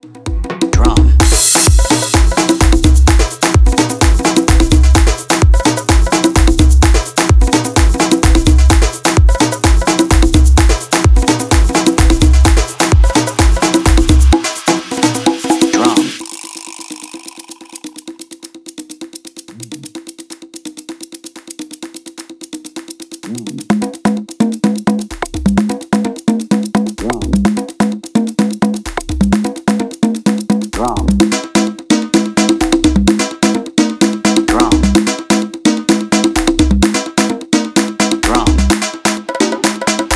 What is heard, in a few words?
The Drum